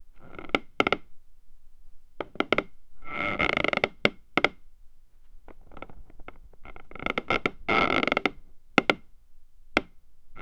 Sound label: wood creaking realistic, indoor